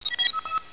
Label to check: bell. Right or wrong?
Right